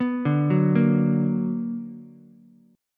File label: B Minor Major 7th, Ukulele; arpeggiated; JGuitar